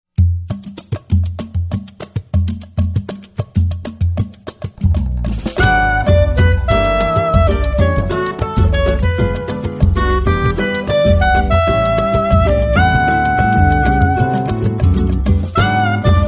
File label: samba